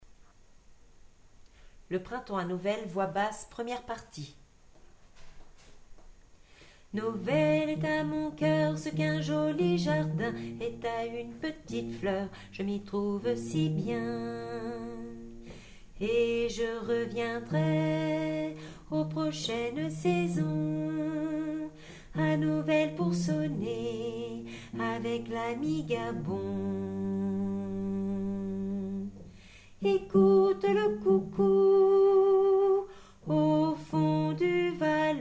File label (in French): basse 1 partie; le printemps novel